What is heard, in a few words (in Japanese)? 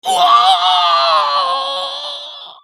死亡; ジャック